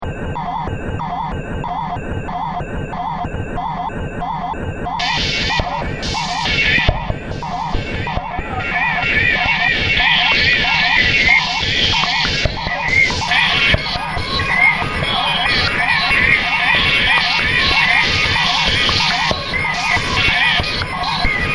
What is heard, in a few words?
kick missing